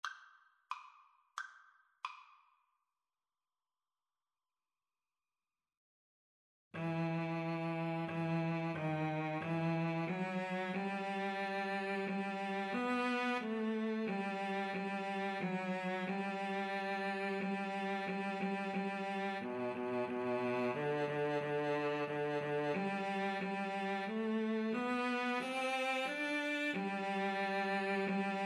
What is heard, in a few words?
cello duet